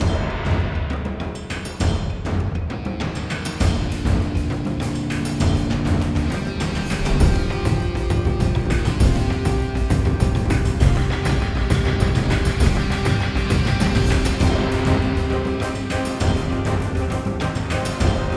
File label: epic drums action; Jamie Coleman, Film Composer